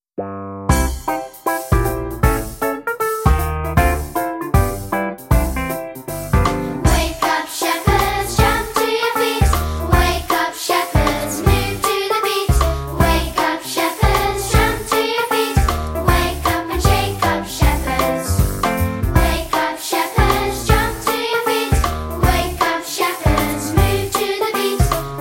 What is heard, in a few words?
Wake Up Shepherds, vocals; children